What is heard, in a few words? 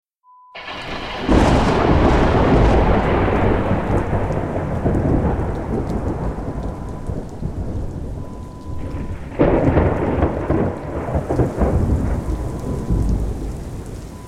A Perfect Storm